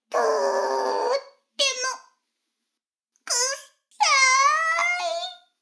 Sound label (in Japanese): シコシコ音声; 逆時間停止されて